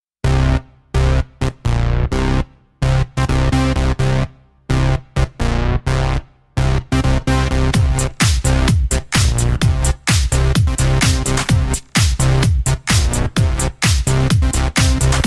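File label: instrumental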